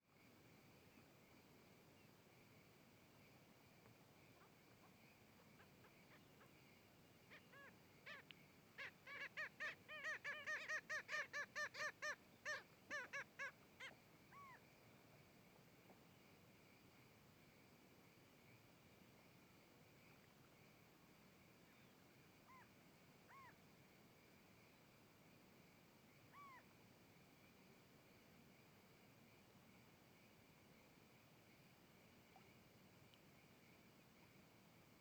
Garcas fim de tarde beira do lago; animais; Coleção Sonora do Cerrado

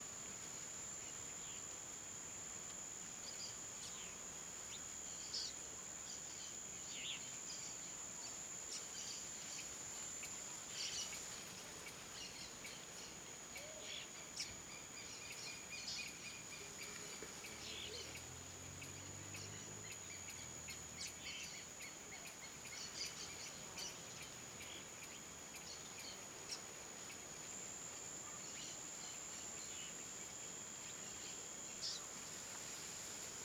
Ambiente com vento com folhas e passaros; passaros cantando; Colecao Sonora do Cerrado

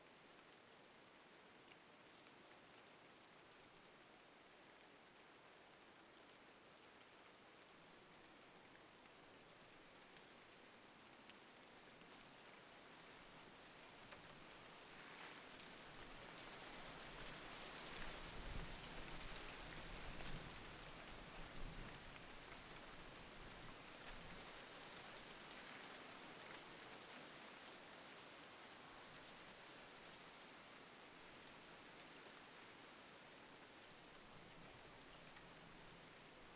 Vento suave com folhas na mata

